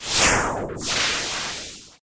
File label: Missile